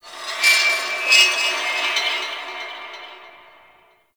High Metal Screech; Props; Warframe; Tau; Ambience

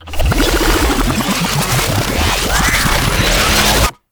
Charge Up, Infested Arm Cannon, Weapons; Warframe; Coda Bubonico

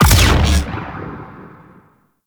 Arachnoid Camper Terra Beam Fire; Warframe; Corpus; Abilities

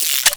Pistol Reload; Warframe; Tenno; Tenno Bard Pistol

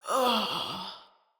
Death Female; Battle; Envoy

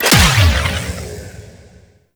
Railjack Thumper Fire; Warframe

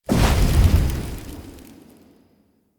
Short Sword Fire Blade Impact; Weapons; Elemental

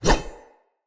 Saw Dagger Whoosh; Weapons; Player; Swords

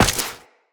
Step Ice, Footsteps Walk; Tenno

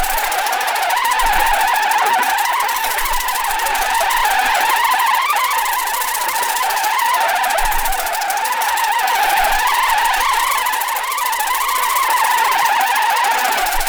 Sentient Marrowtick Swarm Loop; Warframe; Tau

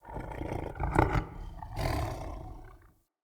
Battle Dog Snore; Animals; Vocals; Snc Battle Dog

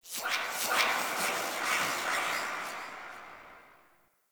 Spirit Chatter; Sentient Lair

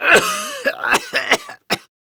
Coughing; PTSD; Warframe; Evacuation

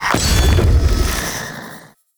Spear Impact

